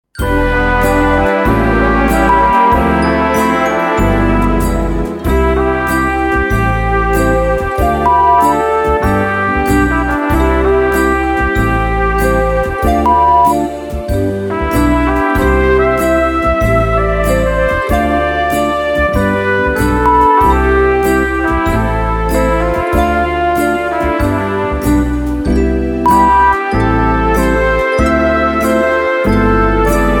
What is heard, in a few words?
XMas Slow Swing C 95; unbeschwert